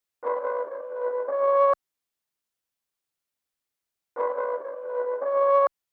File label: 122 BPM B Min Distorted Lead; Loop Stems; Cymatics; 2022 Melody Collection Platinum Expansion; Schism